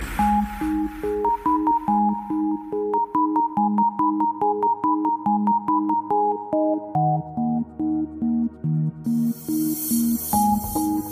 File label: spacemelody